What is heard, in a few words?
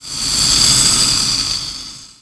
Steam; Finding Nemo; XBOX